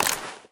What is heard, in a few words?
auto shotgun load shell; weapons; Left 4 Dead 2